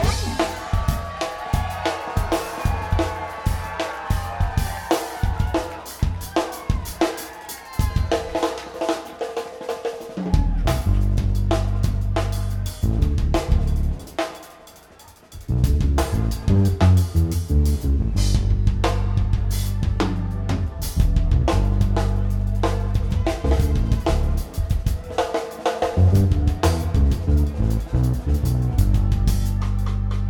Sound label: Jazz Odyssey, improv, jazz; The Bluebird Nightclub Bloomington IN USA